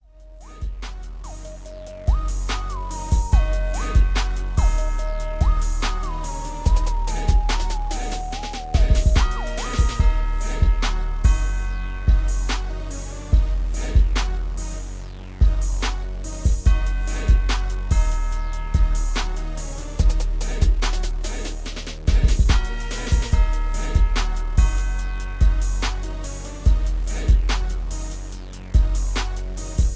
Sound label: 72BPM